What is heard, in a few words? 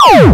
shoot beam